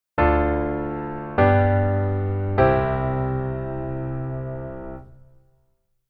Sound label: keyboard voicing low